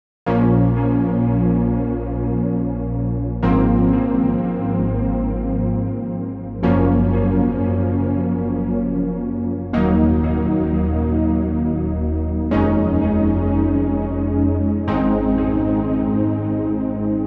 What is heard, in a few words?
Prophet 10 SSM2040 wet; ORDENTLICH NASS